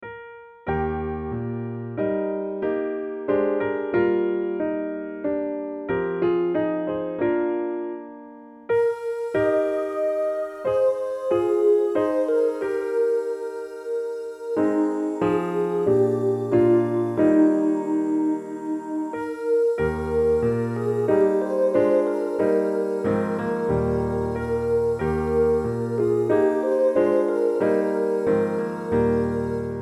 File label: Piano Study Eb MED